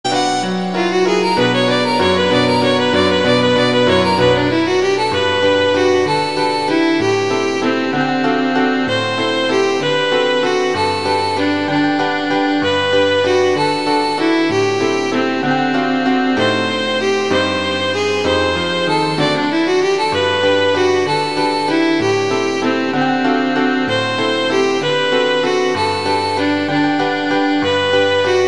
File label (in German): violine u klavier; blumenwalzer